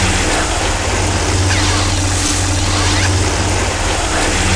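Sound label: item extended lasing loop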